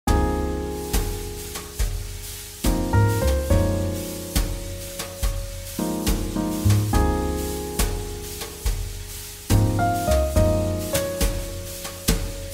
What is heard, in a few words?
JazzBallad; 잔잔